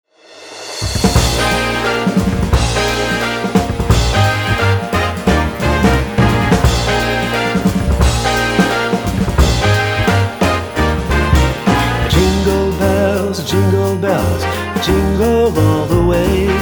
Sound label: Jingle Bells